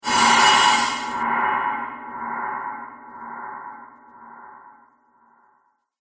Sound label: Reverse Cymbal Whoosh